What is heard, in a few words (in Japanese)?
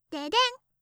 デデン↑, 擬音